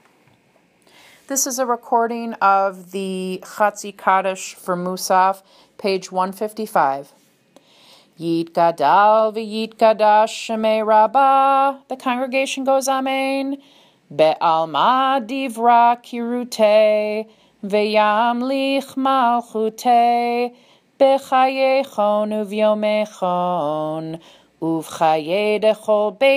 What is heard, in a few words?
musaf chatzi kaddish